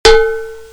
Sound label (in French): Cloche de vache